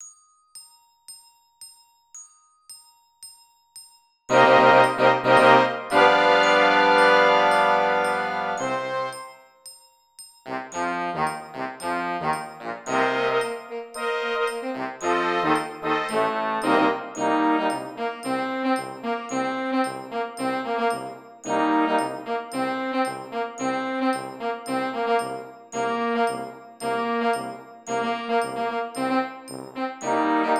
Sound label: w click